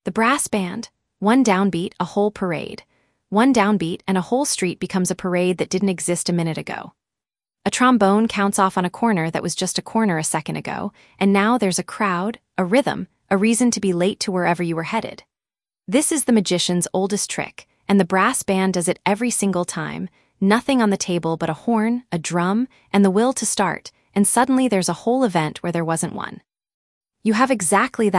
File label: brass band upright; louisiana